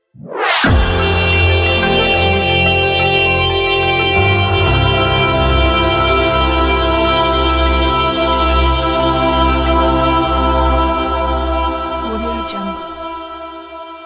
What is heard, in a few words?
peace logo